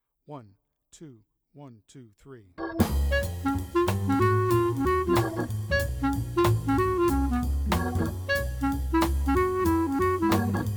clarinet audio sample

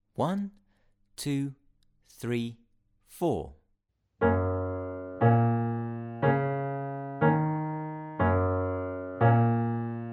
Boogie Beat Playalong 60bpm and 144bpm

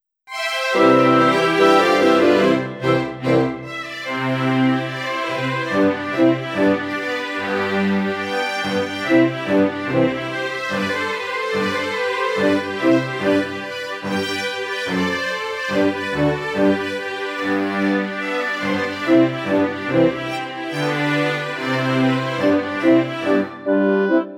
Alt Playback; Der Vogelfaenger

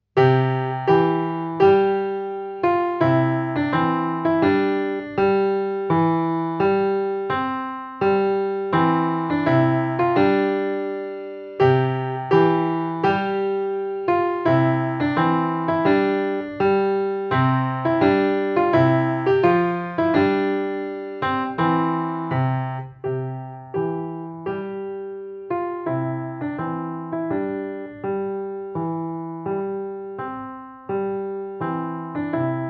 Trumpet Tune

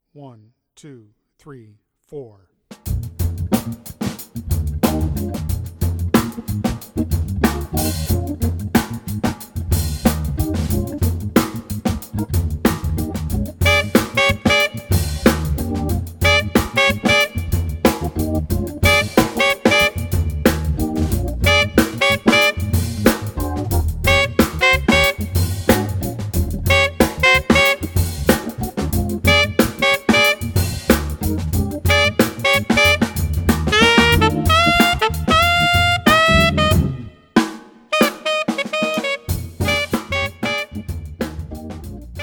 rhythmic audio sample

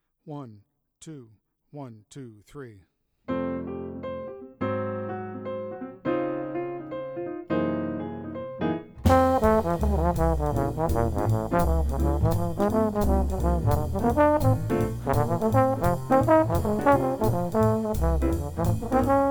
trombone

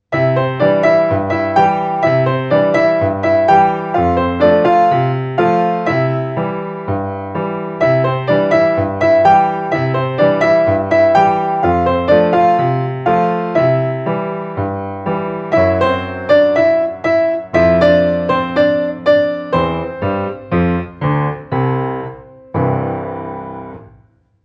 Ragtime

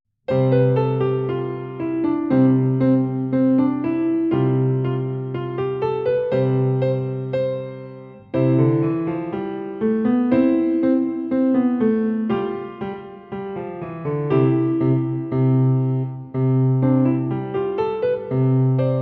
Piano Halfpipe

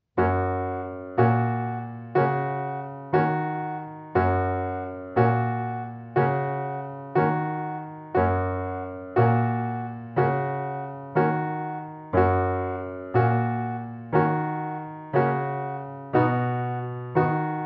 Boogie Beat 60bpm and 144bpm

